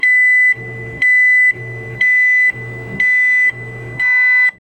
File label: a loud repetitive beeping, safety